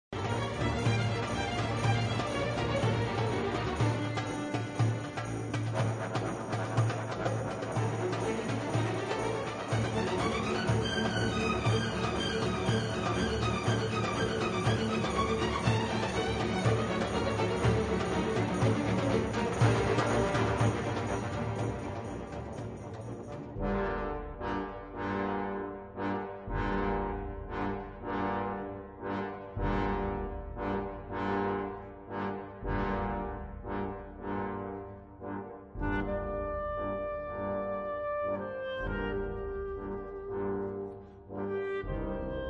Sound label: Clarinet Concerto; Hakola Kimmo